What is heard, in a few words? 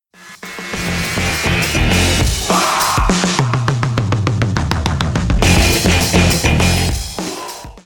Break